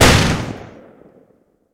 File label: Browning End; DCS